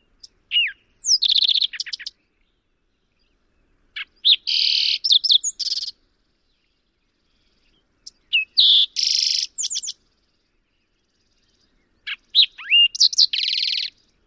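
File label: songs, bird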